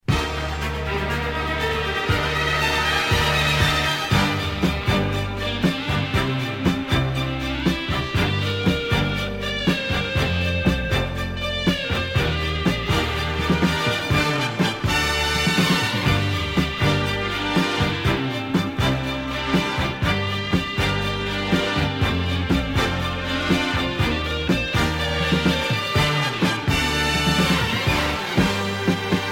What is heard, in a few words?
Jingle